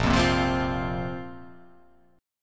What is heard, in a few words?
A sharp Suspended 2nd down; strummed; A#sus2; JGuitar